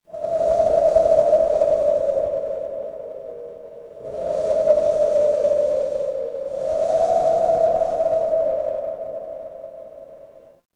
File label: horror wind whisper